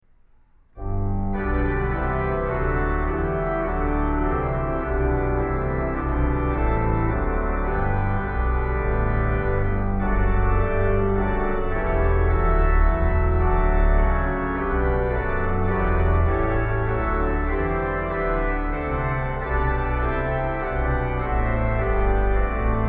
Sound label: two verses Reharmonization; SINE NOMINE